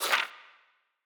Menu SFX Achievement Book Page; Bop It! The Video Game

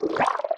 Give Birth; Hatcher

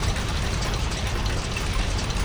Ruins Big Lift Moving Loop

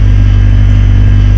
Truck

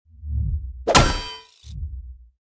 Giving Attention

